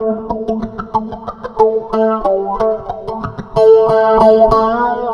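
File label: guitar, Roots Funk; USB Soundscan vol.41; AKAI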